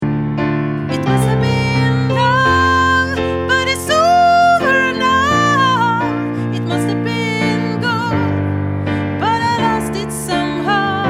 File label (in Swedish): sopran